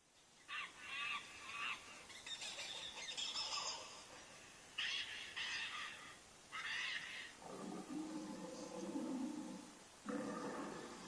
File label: sounds of the rainforest